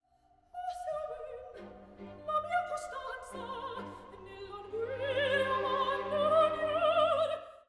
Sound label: Dove sono Dramatic